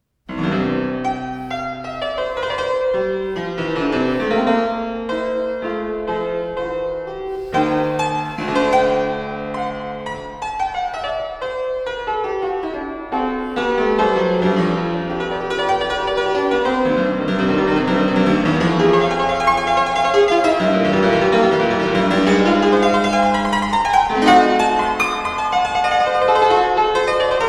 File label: Prelude in C Major